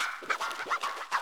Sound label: SCRAT, VNL FX; E-Lab; X Static Goldmine 2; akai